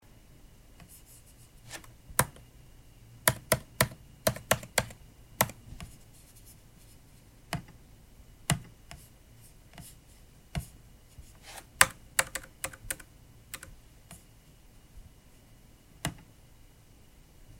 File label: computer trackpad and random typing